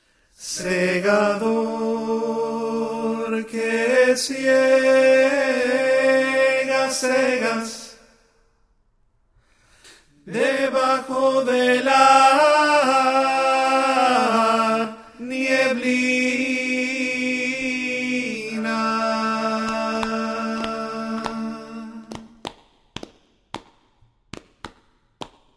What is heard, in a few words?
alto